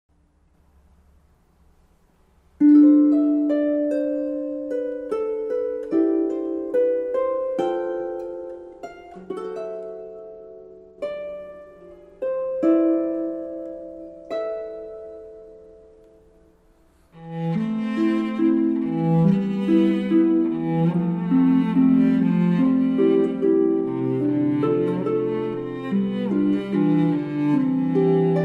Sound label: Cello&Harp Cover